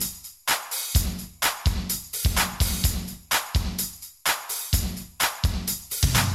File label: Jazz